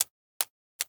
Syringe Tap Flick; Medical; Blastwave

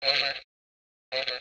walkie-talkie transmition; NS Studios - ultra sound collection

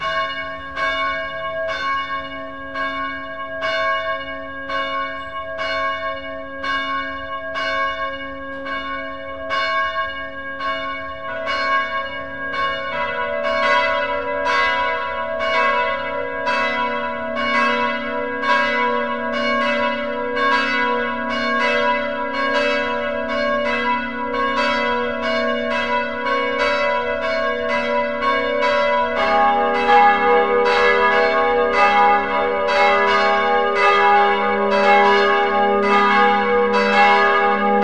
bells church; best service Blue Box

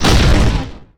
Football Hit Tackle

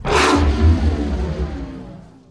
Pickup Scifi

